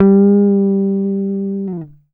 GTR TRIK, Jazzistic; Univers Sons

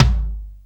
FLOOR TOM; Back In Time Records; Big Bang

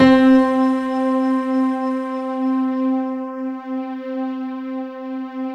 PIA, STRG; Best Service Gigapack 1

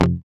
E-Guitar; E-GUITARS

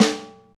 Folk Snare; Snares

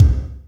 POWER KICK, ACOUST KICKS; Greytsounds

